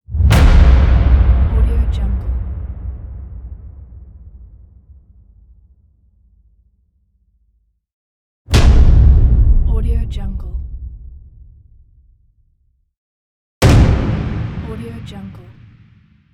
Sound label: hit